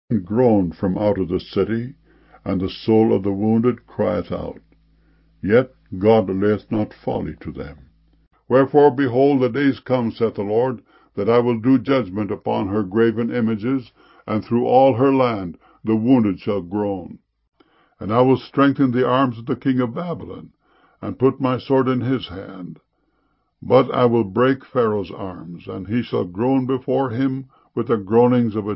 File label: groan